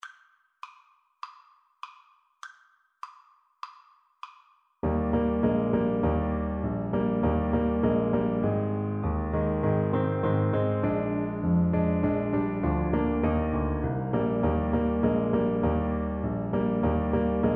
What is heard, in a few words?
jingle bells, Baritone Saxophone